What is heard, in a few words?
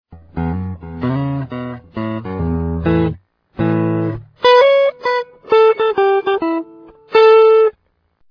blues; us